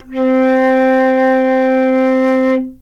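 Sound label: flute C octave 0 vibrato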